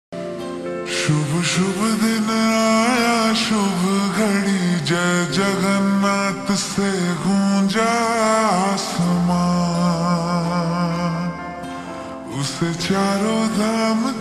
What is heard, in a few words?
Ringtone slowed and reverb